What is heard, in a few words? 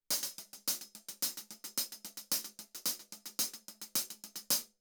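14 hi-hat CLOSED, cymbals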